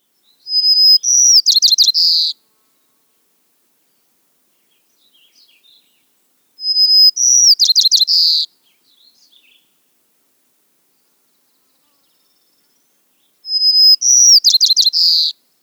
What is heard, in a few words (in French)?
Bruant a couronne blanche chant